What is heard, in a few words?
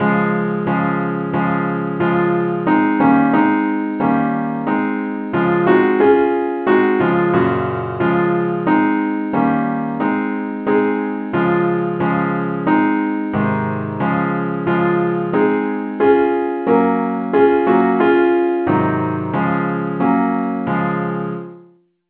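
saint thomas simple acc key d flat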